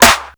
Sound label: natural steel snare drum A key; Mono; snare-drum-samples